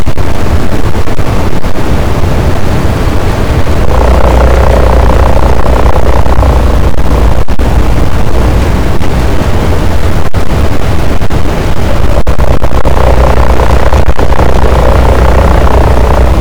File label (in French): cachalot, boat; Physeter Macrocephalus